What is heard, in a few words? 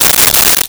Tape Measure; Tools; 1001 Sound Effects